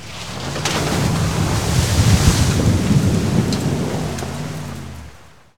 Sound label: Auto Tires Splash Water; Automobiles